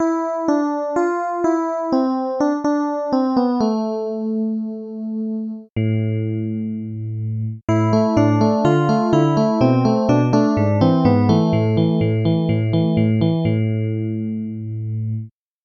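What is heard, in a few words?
electric piano; legend ep 2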